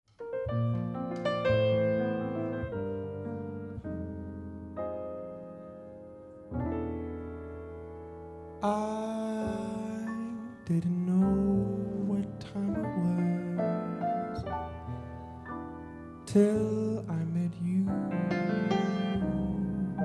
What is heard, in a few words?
jazz trio vocals